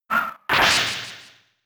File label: Power Whip; attack moves; Pokemon; Gen 4; DPPL HG SS